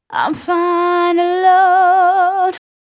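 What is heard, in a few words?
Chorus Female Vocal Dry, Gb, 126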